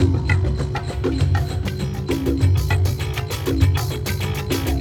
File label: Ambi Groove